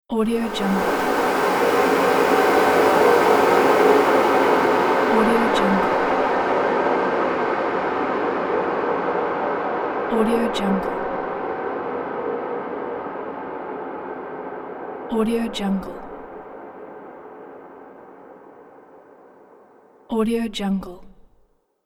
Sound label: horror transition left to right